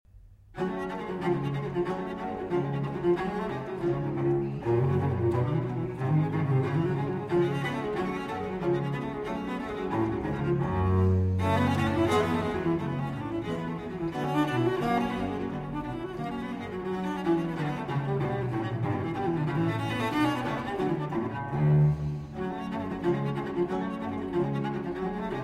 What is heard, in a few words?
Cello Sonata in G Minor, Gigue. Allegro; Vivaldi